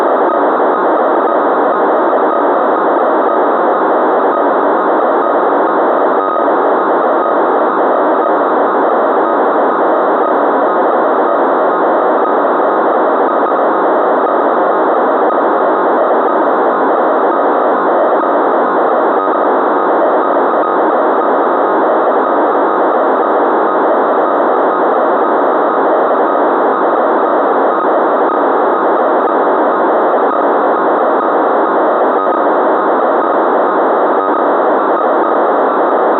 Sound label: DFM09 Signal ok